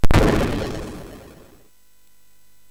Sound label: SFX, MOS6581; Narco Police; hvsc